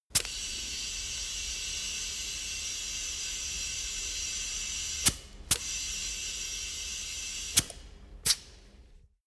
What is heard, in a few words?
tire pressure control sequence